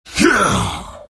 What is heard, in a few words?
exert; dota; beastmaster